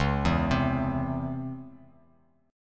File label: Db Minor Major 7th Db; DbmM7 arpeggiated; JGuitar